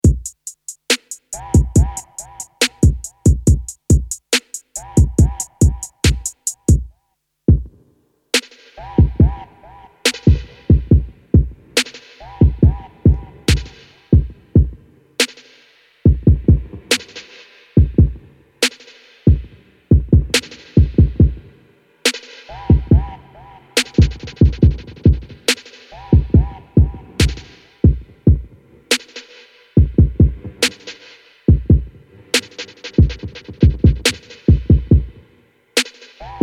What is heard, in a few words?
Akai Force Loop